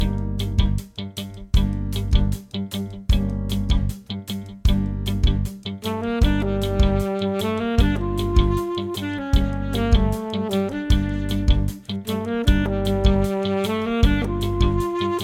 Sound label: Funky sax Piano Drums; Mib/Sib; La première méthode du tout petit saxophoniste